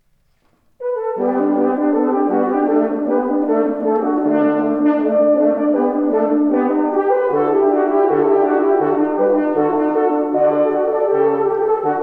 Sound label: Trio; Mozart